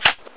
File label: spas12 cock forward; sci arms